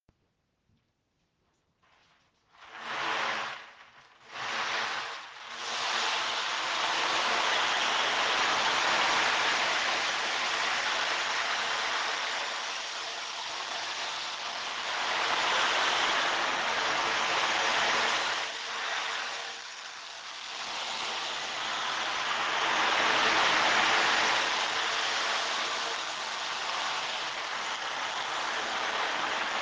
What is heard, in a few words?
Ocean drum